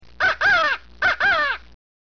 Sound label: feeding, feeding setup, crow call; "Ca-Cawww-Ca, Ca-Cawww-Ca-Ca"